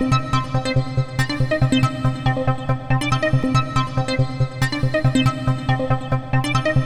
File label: Electro, Melody; Techno; TechnoMusicMaker